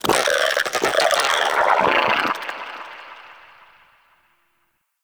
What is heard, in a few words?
Vocal Angry, Shrimp Lantern, Creatures; Baliq